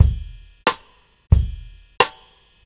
DRUM, COUNTRY; Best Service Advanced Media Trax Modern Composer

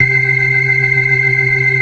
FAST VIB, ORGAN, TREMOLO VIB

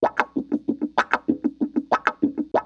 WAH PATTE, Funk Gtr & Wah; PlatinumPhattCD2; E-MU Producer Series Vol. 8 – Platinum Phatt

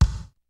kicks snare, kid; Kid Nepro; Elite; KICKS SNARES

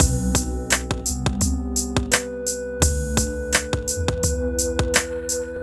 Beats, Synchro Funk; Darktower; Sonic Foundry (Sony Creative Software)

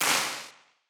Wider Clap; stereo toolkit

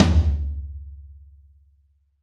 Real Tom, Roland BK-5

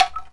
ANKLUNG SI; ILIO; Synclavier Percussion 2; World & Orchestral

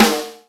Rimshot Snare; Proteus 2000; Digital Sound Factory Proteus 2000

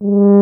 tuba; Miroslav Vitous; Solo Instruments VOL-2

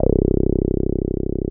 TB 303; CONSTR SET

